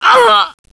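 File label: die, alien controller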